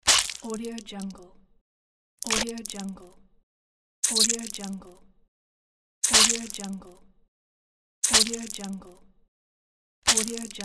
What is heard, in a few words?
blood squirt